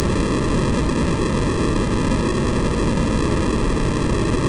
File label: Static